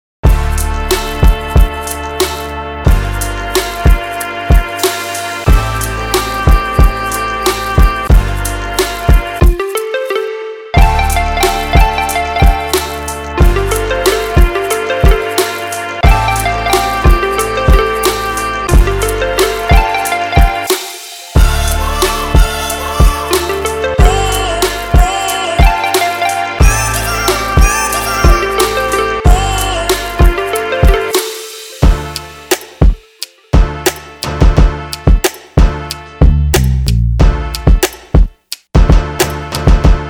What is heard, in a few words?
JAZZY CHOPS